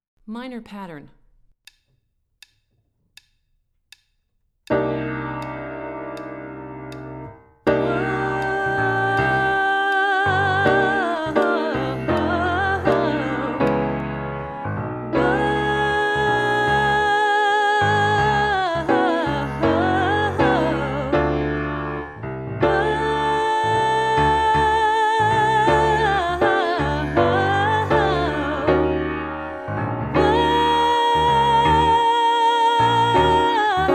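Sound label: Minor Pattern Low, vocal warmups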